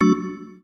button

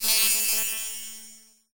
radio wave

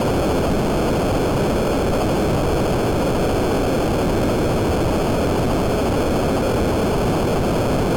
noise

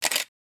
shutter

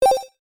button

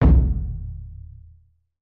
taiko

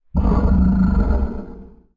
roar

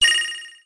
button

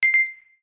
button

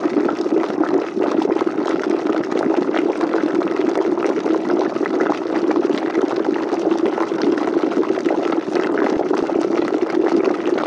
boil

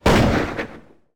gun fire

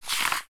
page

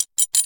metal

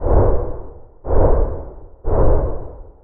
flutter

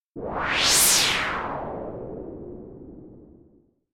magic wind

